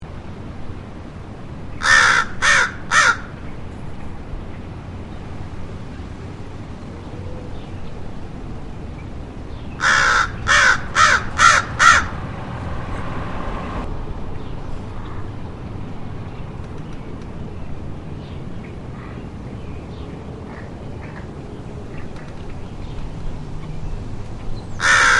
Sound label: American Crow Corvus brachyrhynchos